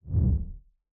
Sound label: thump huge deep swell rumbling surging